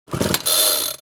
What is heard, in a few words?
Chainsaw starting failed attempt